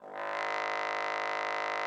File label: trombone G#1; Xsample VOL-3